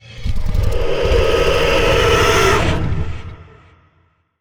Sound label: Vocal Idle; Dialog; Warframe; Zariman; Void Angel